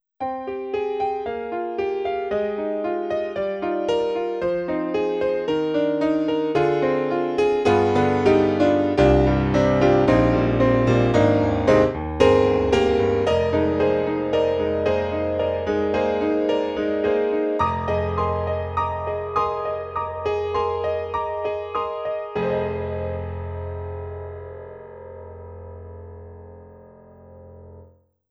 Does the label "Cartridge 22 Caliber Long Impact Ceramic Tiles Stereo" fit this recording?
No